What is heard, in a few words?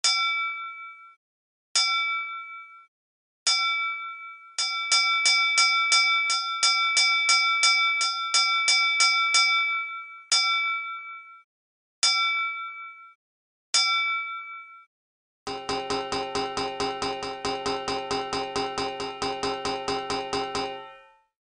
Aground (vessel 100m or more); colregs